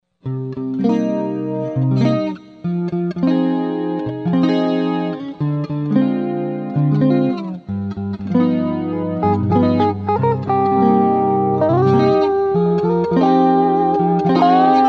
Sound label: c 7 jazz